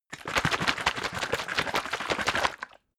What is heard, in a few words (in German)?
bottle syrup shake fast, gastronomie